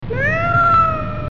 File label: Bobcat Cries